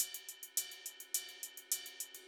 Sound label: credits music; super crush k.o.; Final Cutscene